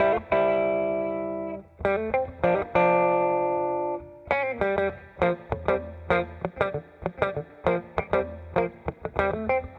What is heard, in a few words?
Smooth Groove Guitar, 70s Analog Funk; Sonic Foundry (Sony Creative Software); What it Is!